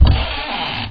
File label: door creak; Squeaky door hinge opening.